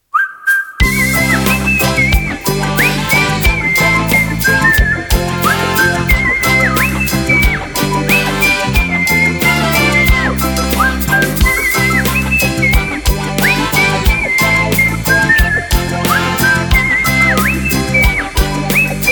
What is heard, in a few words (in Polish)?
melodia popularna